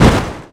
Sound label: hit car; RealCarSim